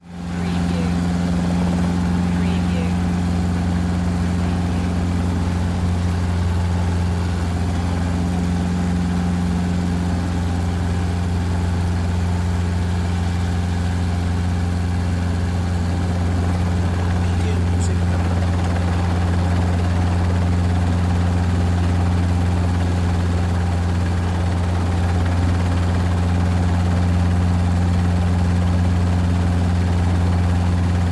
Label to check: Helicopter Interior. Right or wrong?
Right